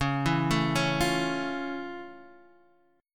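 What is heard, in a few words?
F Augmented Major 7th D flat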